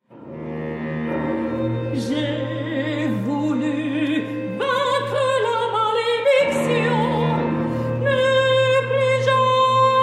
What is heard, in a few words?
Lullaby